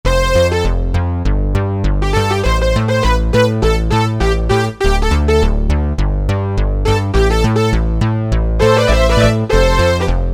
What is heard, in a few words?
Analog Split